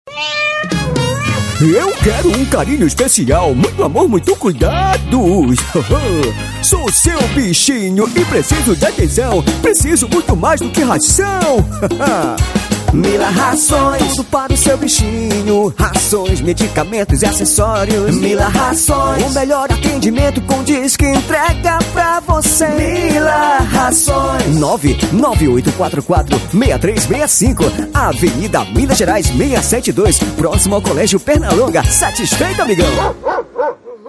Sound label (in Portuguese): jingle pet, Jingle comercial